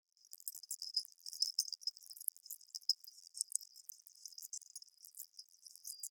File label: Tiny Space Insect